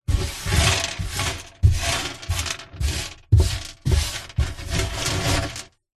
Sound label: stones small gravel sweep collect by hand on empty wooden box lid; foley; recorded inside; zapsplat